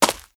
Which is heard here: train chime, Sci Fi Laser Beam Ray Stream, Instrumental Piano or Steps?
Steps